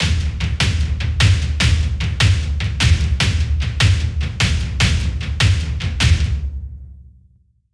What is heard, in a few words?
Cinematic Drums Wet